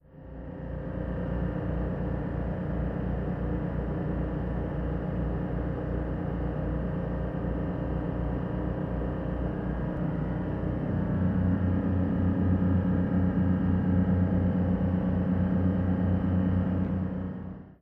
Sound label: Military Pbr: Onboard, Steady Cruise. Dual